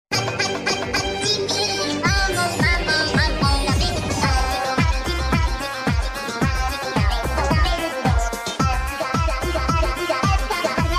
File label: Hachimi Egyptian Version